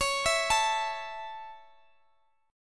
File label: Db Minor Sharp 5th, Guitar, Open G